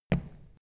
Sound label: step wood indoors